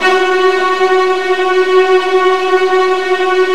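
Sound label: viola tremolo F#1 right, Virtuoso Strings; ILIO; Kirk Hunter Virtuoso Strings VOL-2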